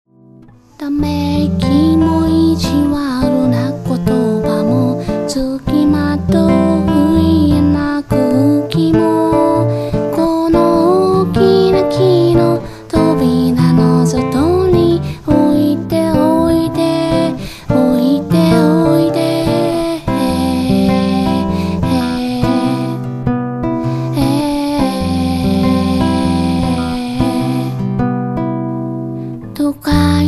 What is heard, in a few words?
music